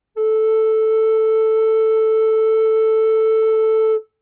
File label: recorder A4